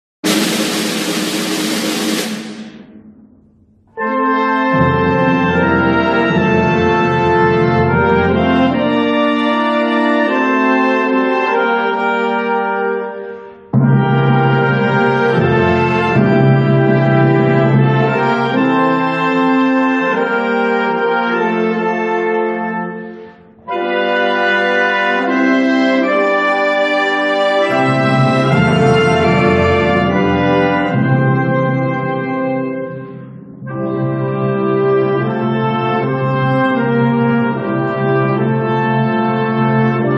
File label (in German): Bundeshymne instrumental